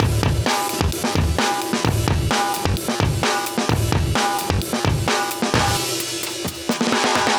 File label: 130 live breaks